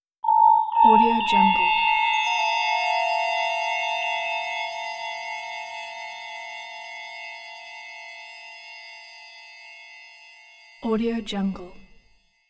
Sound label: thin eerie melodic bells; hollywoodedge